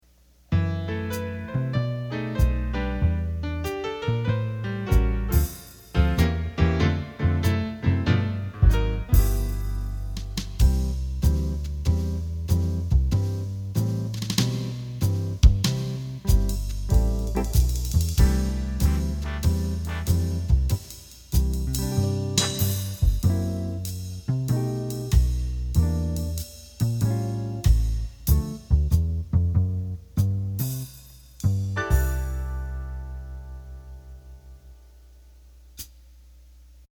Foxtrot, accordion

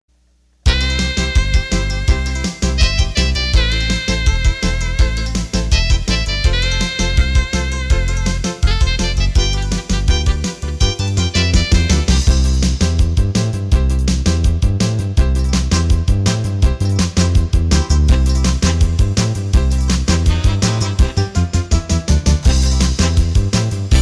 Twist, accordion

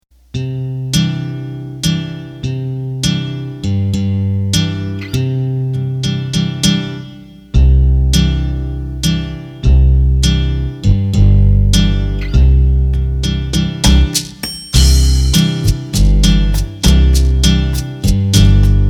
Slow Bossa Nova, Roland accordion; roland bk7m

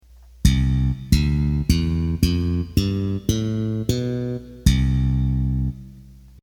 Finger Bass

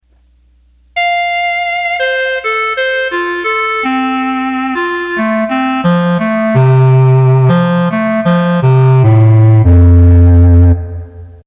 Clarinet, accordion

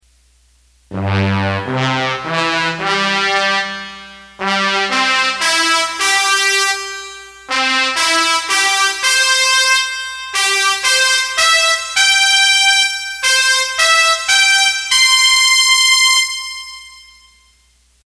ketron Brass

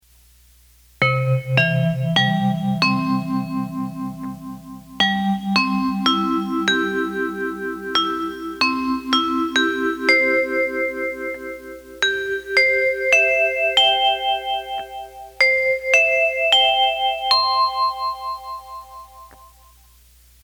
ketron Vibes

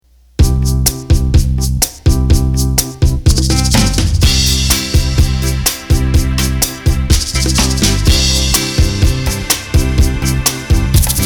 8 Beat; roland bk7m

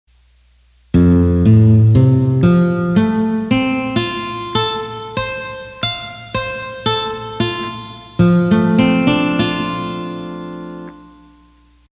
ketron Flamingo

